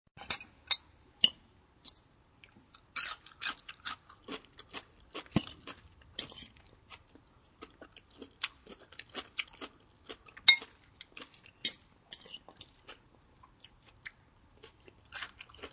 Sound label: Eating Salad, Eating salad sounds; Human